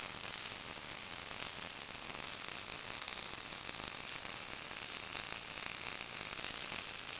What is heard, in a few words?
electric loop; de parking